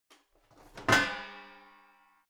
garbage can open lid hit wall

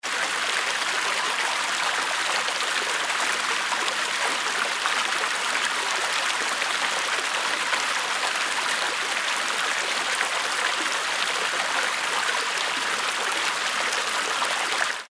water flowing

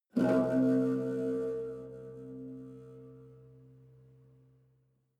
low string buzz wobble

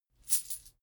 chain mail armor movement

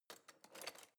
knife wood drawer place soft

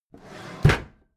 packed drawer smooth clatter open heavy

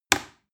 plastic button little push heavy

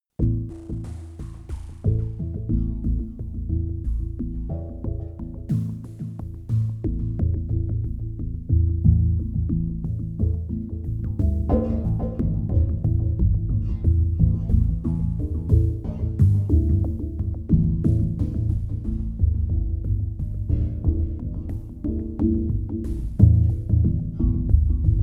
sequence noisy bass sequence

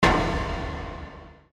metallic hit

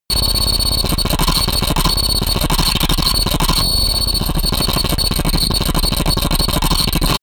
typewriter sequence

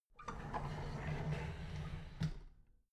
drawer big clatter close soft

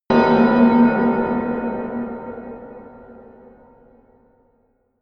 melodic disharmonious bell high

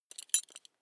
metal belt buckle clatter regular

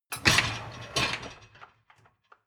dishwasher lower tray draw in short

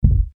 heartbeat

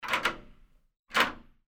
door

